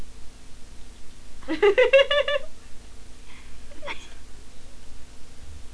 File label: laugh bout